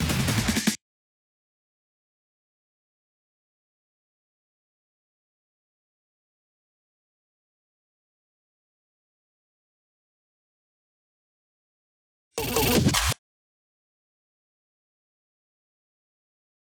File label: fill